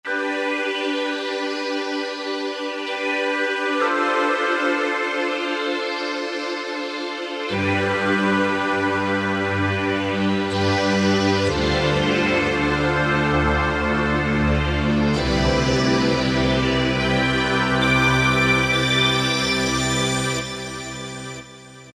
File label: Flow Motion, House Collection; Roland SR-JV80-19 House Collection